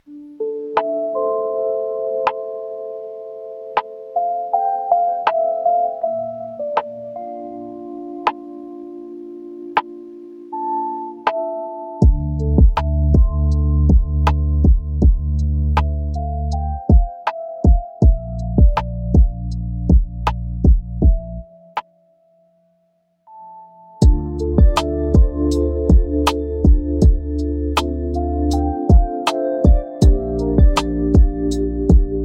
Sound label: focus lofi